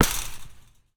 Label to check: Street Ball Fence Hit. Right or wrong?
Right